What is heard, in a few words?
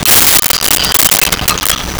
Pickup Dishes Tablecloth; Vintage Cartoon; Foley